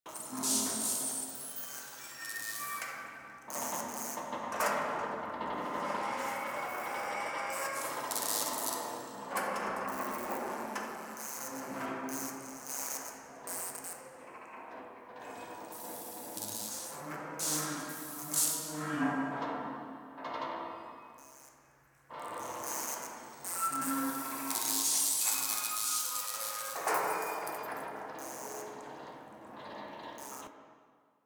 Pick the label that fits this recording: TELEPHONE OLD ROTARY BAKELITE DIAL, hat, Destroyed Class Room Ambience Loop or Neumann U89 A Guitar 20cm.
Destroyed Class Room Ambience Loop